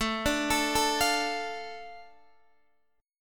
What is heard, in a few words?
A Suspended 4th Sharp 5th, Guitar DADGAD